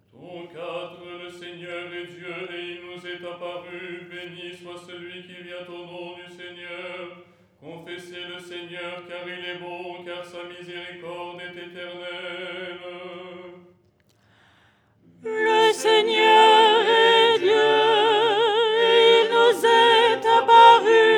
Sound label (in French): soprano; " Le Seigneur est Dieu… "; Les 8 tons en français; ton 4